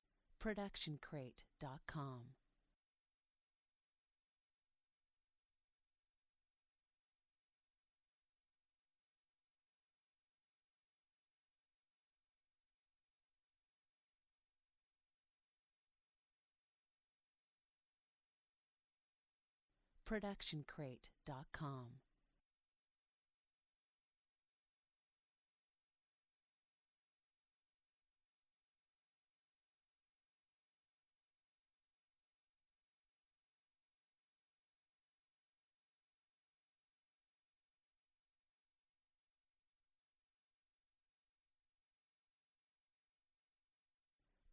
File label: Insects Flying Buzz; SoundsCrate